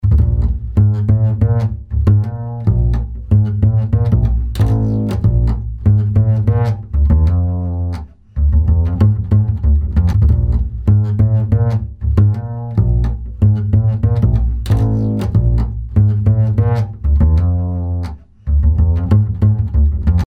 Upright Funk Bass; Apple GarageBand 2; Apple Loops

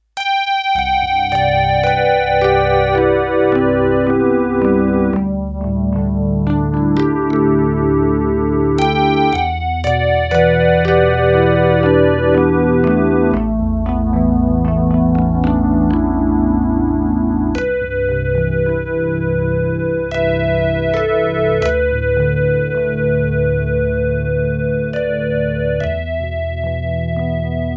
Lead Piano, Korg DS-8; Factory Patches

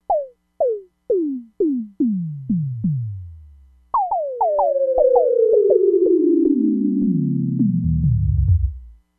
SYNDRUM, Crumar Bit 99; Factory Programs

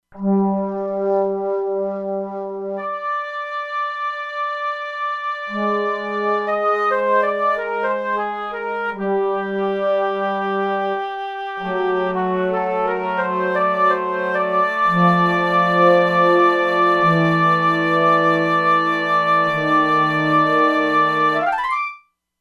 Flute-Oboe, E-mu Proteus-2; Factory Presets

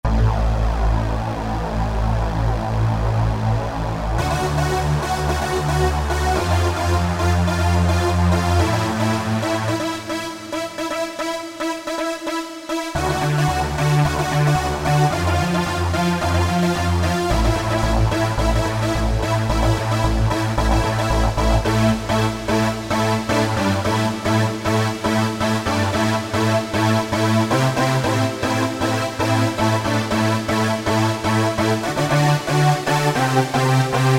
Dnc Stack 1, Roland SRX-07 Ultimate Keys

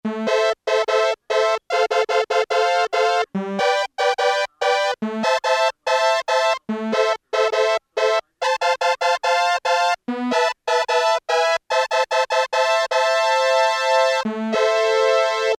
BRASS ENS, Casio Rapman; Tones

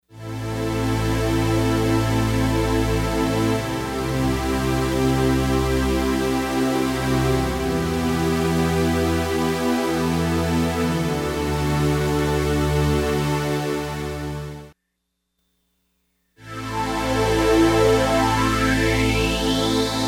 LFO Filter, Lexicon MPX 1; Factory Programs

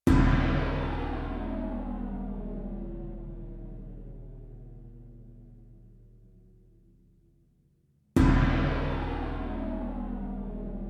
Big Sound, Timpani & Gong, Yamaha TX16W

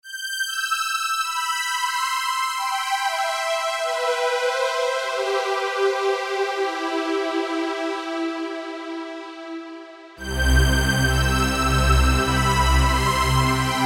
SYNTH TEXTURES - Pad; Lalaria Pad; Apple GarageBand 2

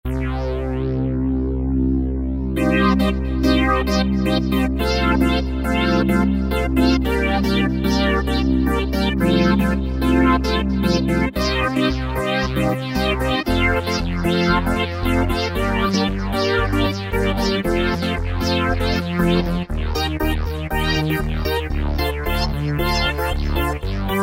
Roland V-Synth Retro Disco; Factory Patches

